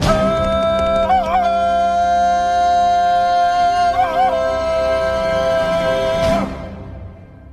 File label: tarzen yell